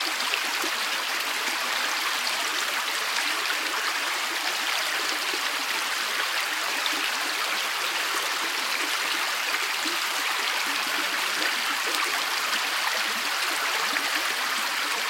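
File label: water sounds; zvuki vody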